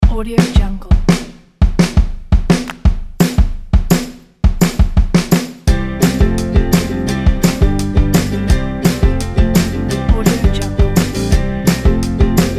happy positive

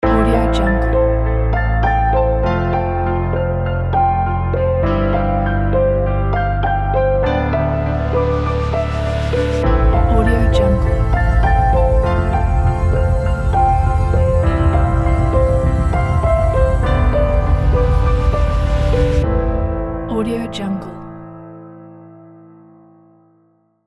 cinematic film logo

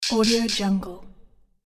ui future menu

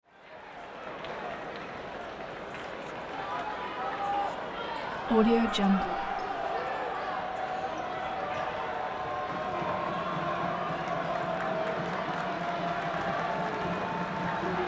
gym crowd cheers and applauds

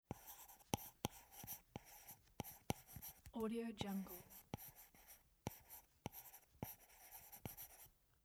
writing on a tablet

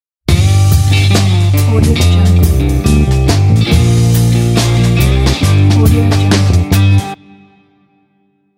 cowboy logo

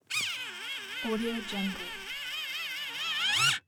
automatic pencil sharpener struggling

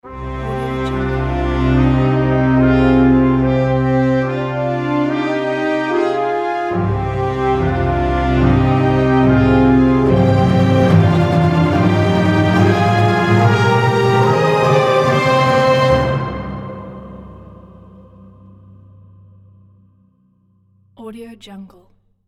epic orchestral trailer logo

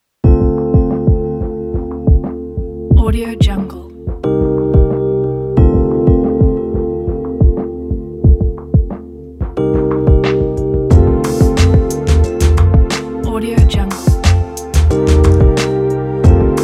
lofi fashion lounge

